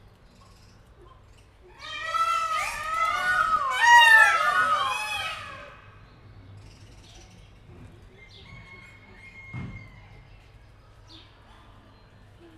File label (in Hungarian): távolból, páva; nyiregyhazi zoo 2019